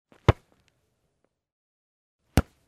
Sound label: Football ball kick